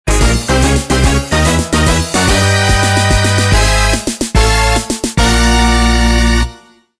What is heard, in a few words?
Jingle Chance; Waluigi